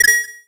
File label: Get Rupee; Get a Rupee; zelda